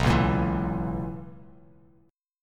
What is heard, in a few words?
B Major 7th Suspended 2nd Suspended 4th B Bass Standard; strummed; 7,4,8,9; JGuitar